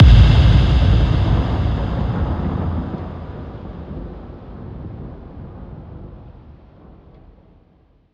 Impact Thunder; Riddim; One-Shots; SFX; Ghosthack; Riddim Essentials Volume 2

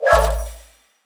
Gravity Switch FX AMaj7; Video Game; Cymatics; Video Game Sample Pack Vol 1

